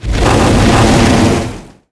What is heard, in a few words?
Pillar Of Fire